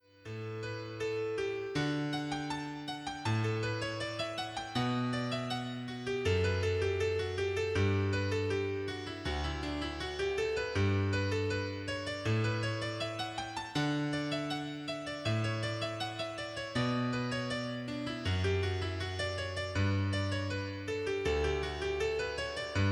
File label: piano; J.Pachelbel Canon in D arranged for piano by Dias Karimov